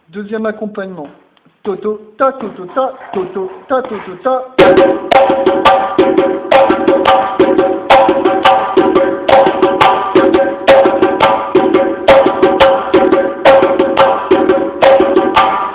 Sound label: Djembé; Kuku